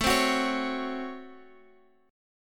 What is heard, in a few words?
C sharp Major 7th Suspended 4th Sharp 5th, A